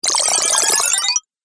Fantasy Spell, magic sound effect

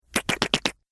Arcade Game Fast Splatter Sound; Video Game Sound Effects; Retro Inspired Sound Effects - Think Nintendo, Atari, C64; Andrew Fleming

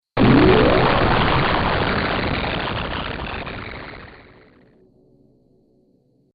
Exploding Teleport Device, sci fi

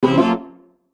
CHIME STRINGS; Blastwave

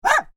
animals dog bark small; Dogs; Dog Bark (Small) 1; McKinney Sound

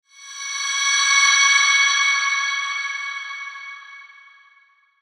SHIMMER RING; Shimmer Ring Swell Metallic; High Tone; Blastwave

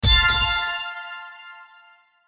Hit Thud Pan Twinkle; Blastwave

